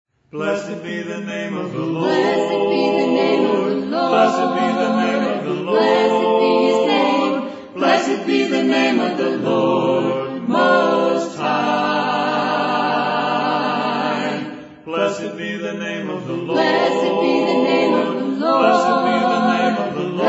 a capella hymn; blessed be the name of the lord

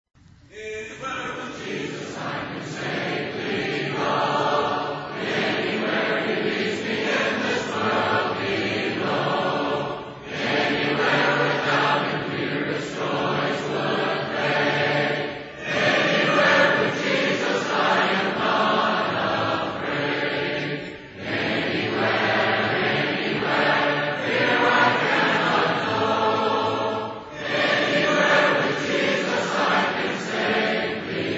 a capella hymn; anywhere with jesus

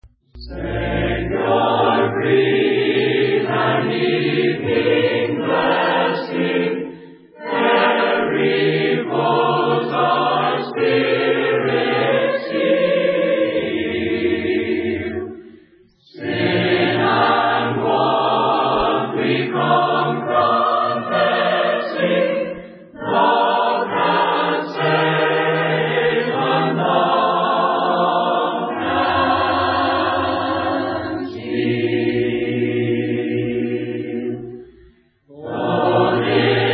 a capella hymn

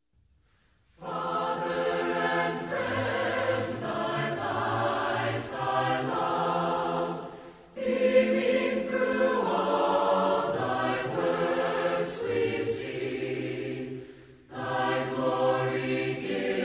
a capella hymn; father and friend

